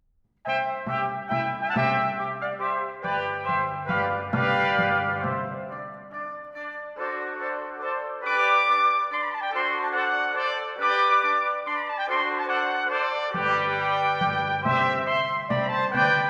Divertimento in C major, for 5 trumpets & 4 timpani - Andante; Mozart; Serenades-Divertimenti-Dances